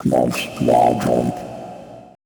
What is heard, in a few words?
FX VOCAL